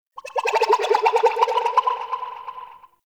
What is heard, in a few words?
Ocarina; Warframe; Nokko Colony; Ambience